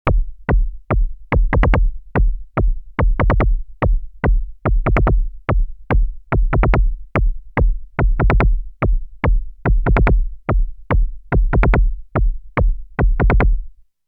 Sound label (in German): Bassdrum; Treadstone